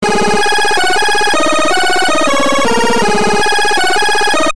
ChipTuner mono

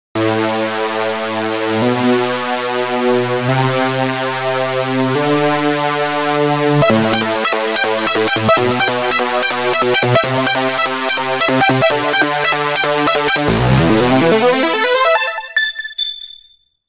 Spacesynth

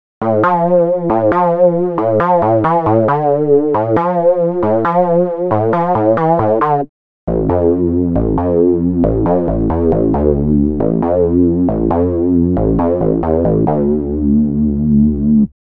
Night Shift Bass mono

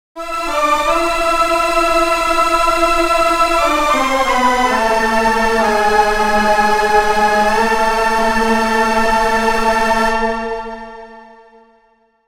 Dramatic

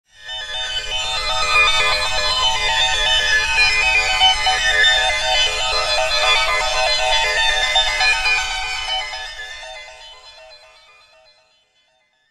FX Hustling PAD

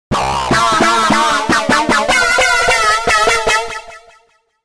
Screamer Synth

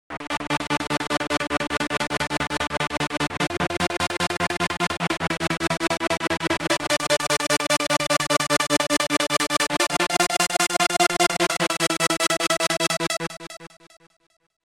Ping Pong Attitude

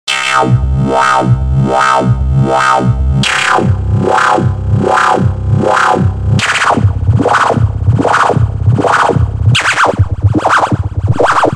Metal Acid mono

